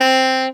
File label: Alto Sax Portato C3; Orchestral; VarazdinOrchestral